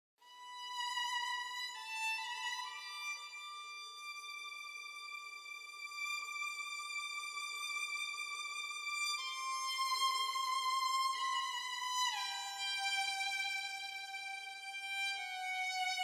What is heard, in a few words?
Stinger Gameover Positive Stem Solo violin